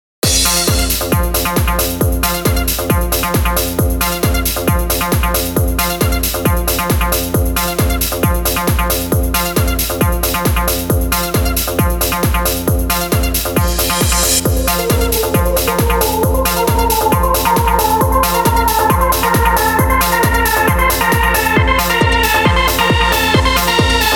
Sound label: trance eq